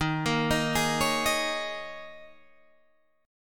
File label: D sharp 7th Suspended 4th, Guitar, Standard; D#7sus4 arpeggiated; JGuitar